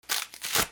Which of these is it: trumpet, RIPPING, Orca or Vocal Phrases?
RIPPING